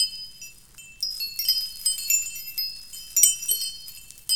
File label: CHIMES, JAPANESE